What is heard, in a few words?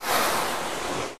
tendril antic; gg pure vessel